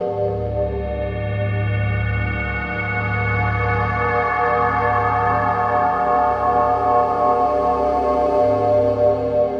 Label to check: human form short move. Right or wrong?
Wrong